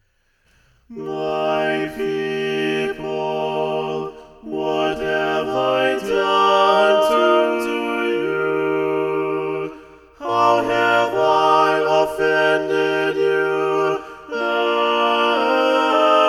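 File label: The Reproaches Part 1 SATB Balanced Voices, Rehearsal Tracks; Tomas Luis de Victoria; ed. Geoffrey Cox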